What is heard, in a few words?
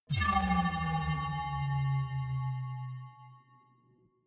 energy field vanish medium